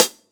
Hat

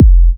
Minimal Kick, Drums, Kicks

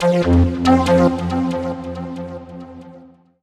TECHNO SYNTH 140; Keys

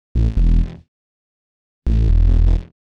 SNTH BASS TEKNO 140 A

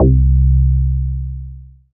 BASS STER.

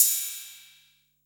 808 CYM, TR-808

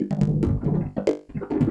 Industrial 140

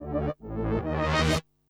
TECHNO EXTRA 140

